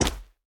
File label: footstep metal; Smite; Vulcan